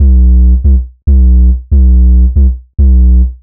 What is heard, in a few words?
140 B BASSLINE, Dubstep; Loopmasters; The New Sound Of Dubstep; BASS LOOPS